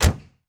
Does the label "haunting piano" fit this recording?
No